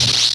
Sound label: ls block, weapons